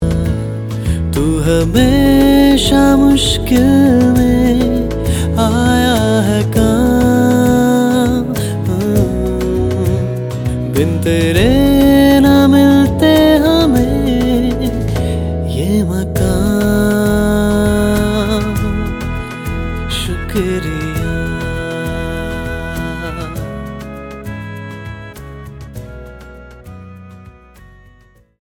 THANK YOU HINDI FRIEND TO FRIEND MALE

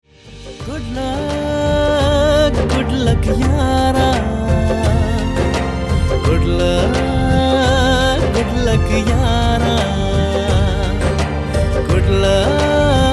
BEST WISHES TUNE MALE

